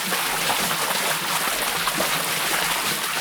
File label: Auto Tires Water Loop; Automobiles